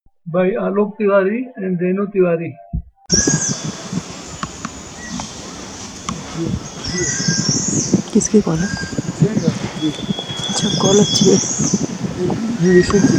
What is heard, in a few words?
Eurasian Jay song; Alok